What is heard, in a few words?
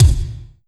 Yamaha RM50 Metal Kick